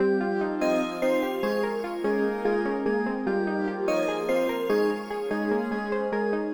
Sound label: all melody